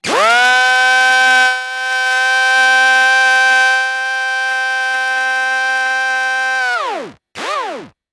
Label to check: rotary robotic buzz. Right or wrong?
Right